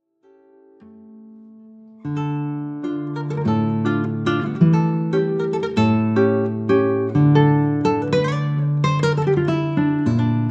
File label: guitar; Vals opus 8 no.3 by Agustin Barrios